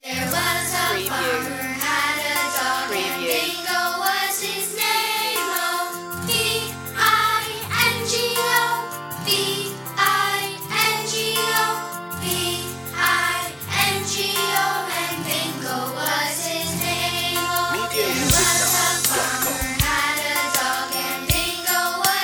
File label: children group vocal